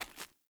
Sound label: Walk Stone, Footsteps; Player; Mother